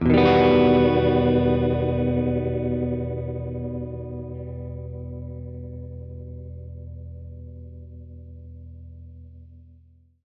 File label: Gretsch Chord Emin9[maj7], Guitar Chords; undercover samples